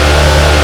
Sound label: 4 banger low, vehicles